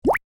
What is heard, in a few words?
Water Drop Notification Evening; Xiaomi Redmi-Note-12-4G